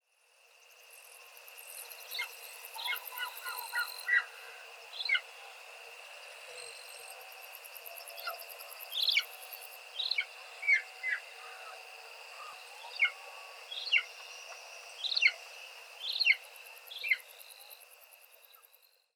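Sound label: Golden Eagle; inuwashi; hyogo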